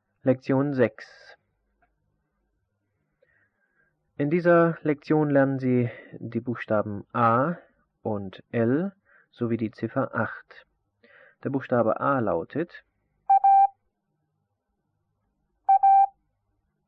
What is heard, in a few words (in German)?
Morsen; Onlinelehrgang